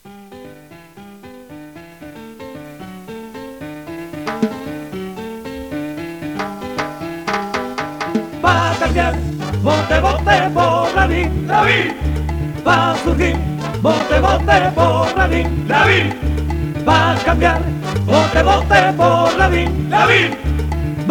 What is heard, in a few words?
Jingle; Lavin 1999